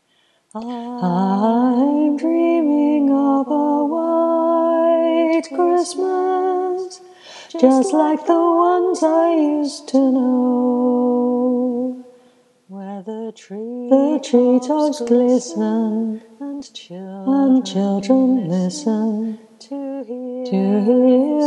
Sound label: Harmony highlighted